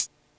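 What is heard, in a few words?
808 kit closed hat; Dr 202 Kit 1; Percussion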